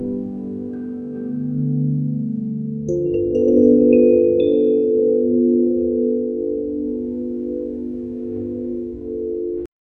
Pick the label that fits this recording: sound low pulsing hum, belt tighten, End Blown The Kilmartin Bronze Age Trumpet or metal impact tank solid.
sound low pulsing hum